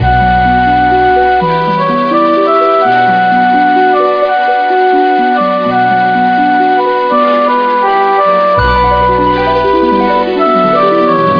Melodious Loop

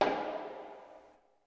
industry hit b3; fx; PC Gamer IT CD 40; SMACDEMO

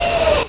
snap

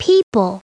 people

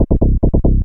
moog; Techno Music Maker; Bass

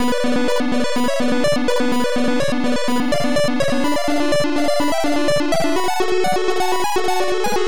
false pitches, chiptunes

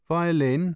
violin

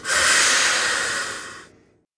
steam; Toy Story Activity Center (Disney-Pixar)

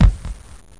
boun; balls

